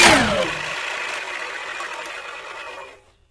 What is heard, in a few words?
minigun wind down; weapons; Left 4 Dead 2